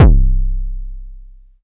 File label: DRM BD Warehouse; Polyend; Plughugger